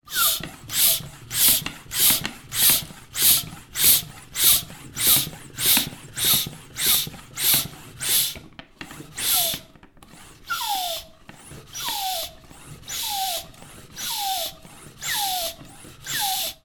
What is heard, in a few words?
household pump hand manual floor standing pump med then slow